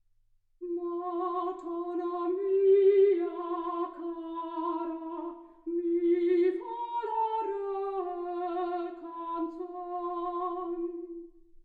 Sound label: soprano